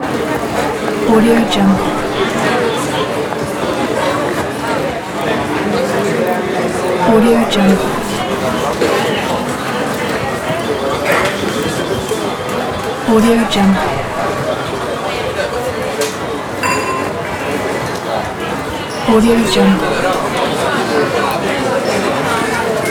airport crowd sound

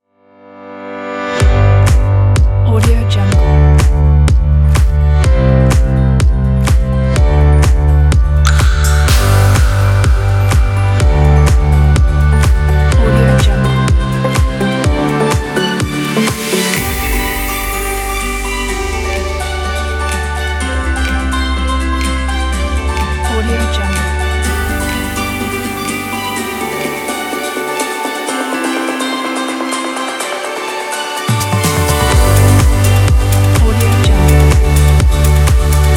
upbeat inspiring corporate

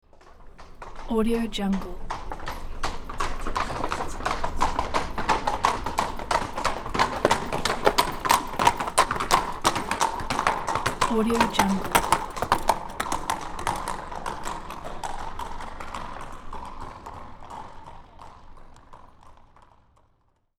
two horses pass by on cobble street

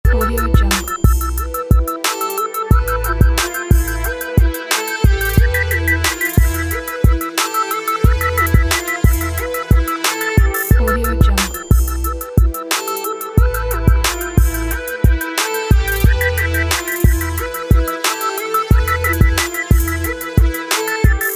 hip hop background loop

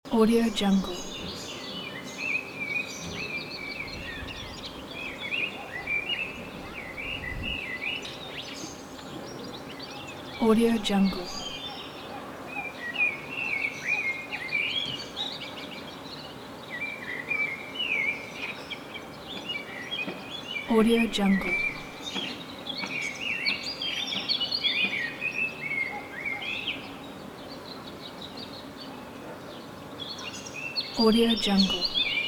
ambient bird; carcasound

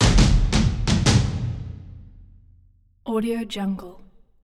war ui drum movement war ensemble

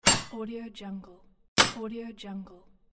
sword clang

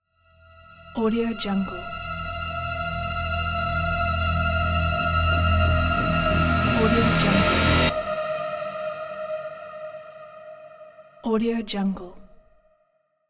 cinematic tension builder; ponyrai

